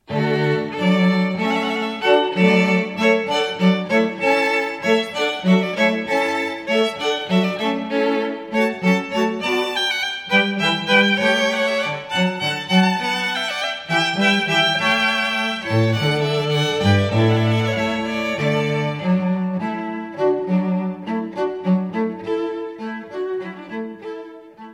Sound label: Hornpipe, string quartet